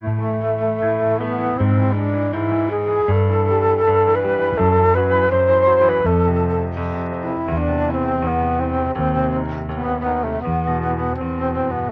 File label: piano and flute, Sad Loops